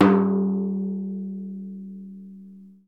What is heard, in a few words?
deff open; Deff Bendir &Req